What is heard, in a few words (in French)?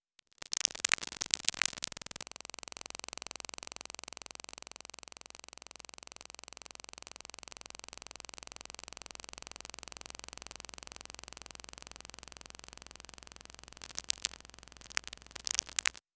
insecte synthetique